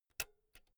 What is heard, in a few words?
pull out jack cable slow; objekte; elektronik